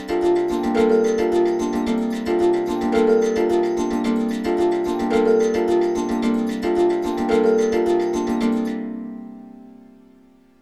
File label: Trance SYNTH SEQ, 110; AMG; Global Trance Mission VOL-2